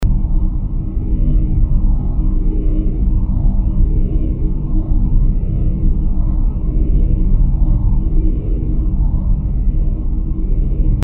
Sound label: Mech Drone